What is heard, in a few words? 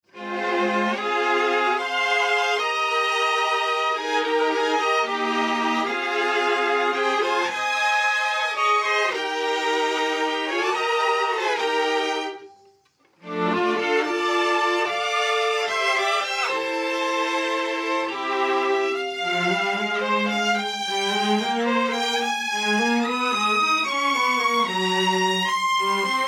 Strings 2nd Comp On